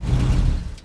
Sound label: merc off; Mafie 1